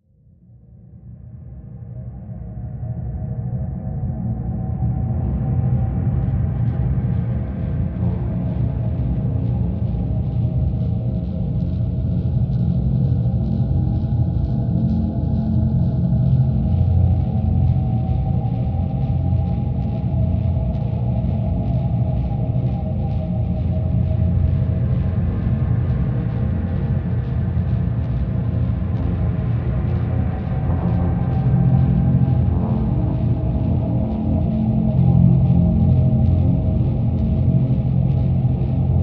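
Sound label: Drone Hypersleep F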